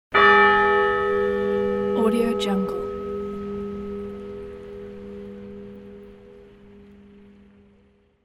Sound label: church bell